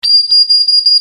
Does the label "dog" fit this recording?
Yes